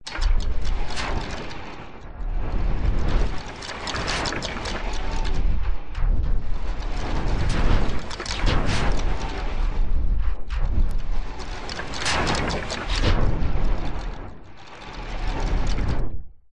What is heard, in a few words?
Designed Mecha Sequences Mechanisms; Gregor Quendel